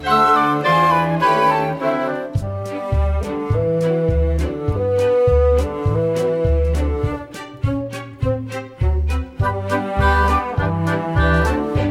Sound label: Lovely Night Backing